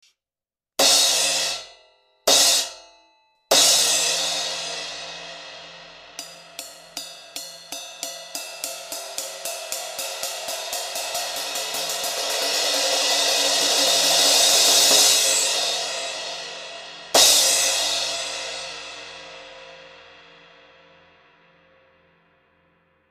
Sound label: Mist 18 Inch Brilliant Mellow Crash